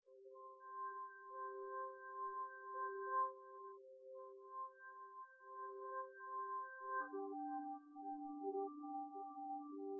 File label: nodrums synthconv 250ms 1m; SiSEC08; SiSEC underdetermined